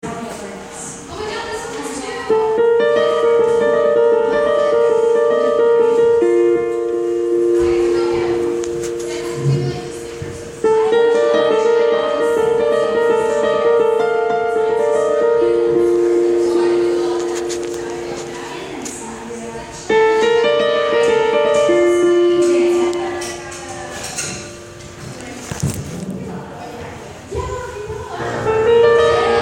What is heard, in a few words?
piano noodling as ballet class lets out convos weave in out of the music